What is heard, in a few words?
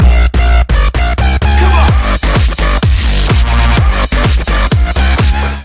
instant drop